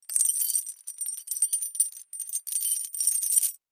Heavy Chain Movement

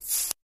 DC Arc Across Knife, arcs & sparks

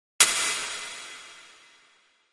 Hit HF Metallic Clang

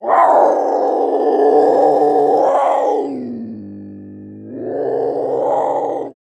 Puma Cougar Growl Lon, big cats cougars

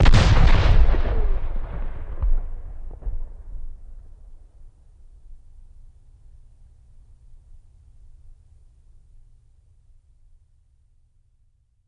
Cannon Shot Single, shots

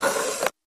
Door Outer Close Dam, dat machine

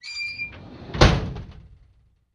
Drawer Metal Close; drawers

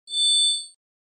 Elect Pulse, multimedia element

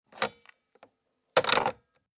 Tel Hang Up Rotary

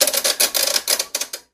Washing Mach Knob Sw; washing machine

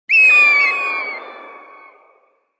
Hawk Eerie Call 4; animals

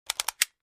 Flash Gear Four Stagg, flash gear

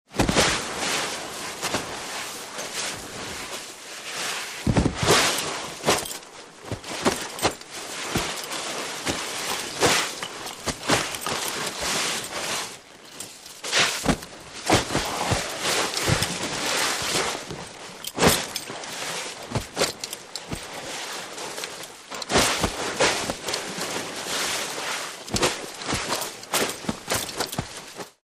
Body Drag Brush Dirt; bodyfalls

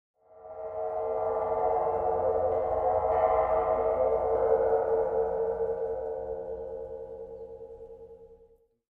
Cymbal Med Soft Trill, drums cymbal

